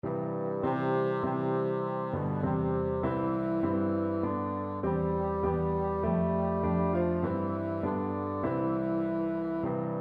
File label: hark, bassoon